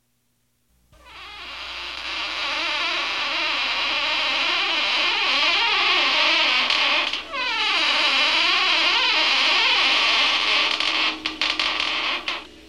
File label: Creeking